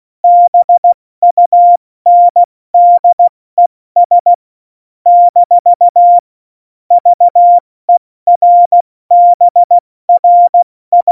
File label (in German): Witz, Morsekurs, 70 F80